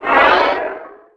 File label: force throw